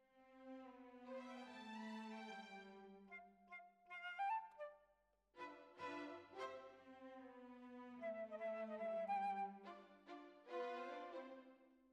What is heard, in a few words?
Flute